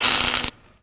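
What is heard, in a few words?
electric spark welding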